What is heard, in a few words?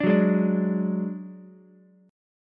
Gb Suspended 2nd Sharp 5th, Ukulele; Gbsus2#5 strummed; 7,6,4,5